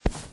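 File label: female step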